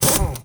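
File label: Impact small, air tools, industrial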